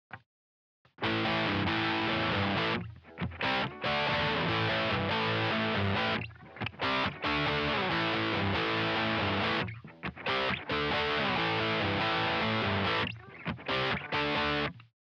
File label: Lazy Les Paul in a rock stack; admiralbob77